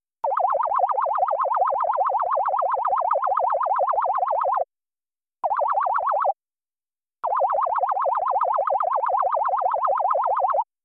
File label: Laser Alarm